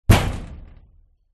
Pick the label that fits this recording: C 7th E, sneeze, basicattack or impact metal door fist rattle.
impact metal door fist rattle